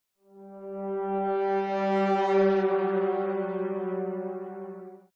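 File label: Creepy Sound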